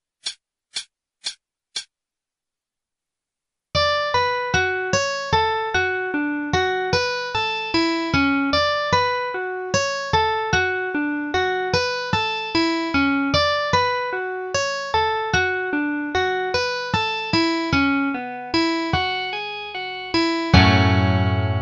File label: moveable arpeggios, 50, guitar